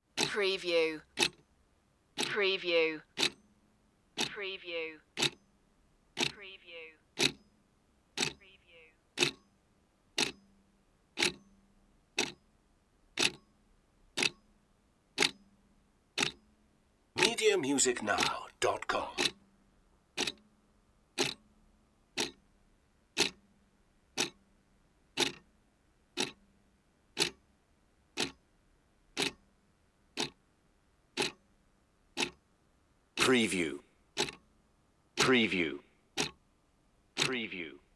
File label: clock tick